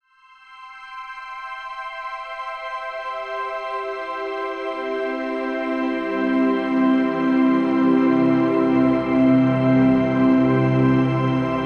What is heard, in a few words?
Bed Strings Descend Reverb Wash Delay; Bed Sound Effects; Blastwave; Production Element Imaging Element Accent Transition